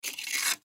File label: Lid Pick Up Cup; Pick Ups (Sound Effects:Objects); Blastwave; Foley